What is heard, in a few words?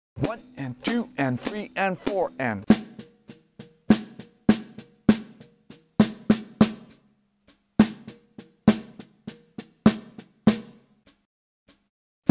Melody Straight 8ths